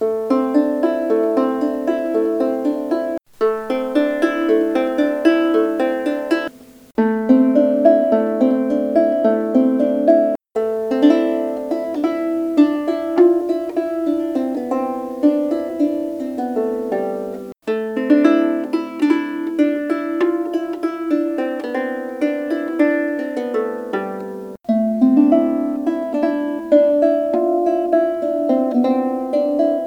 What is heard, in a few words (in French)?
lyre chevalet chene vs erable vs ambre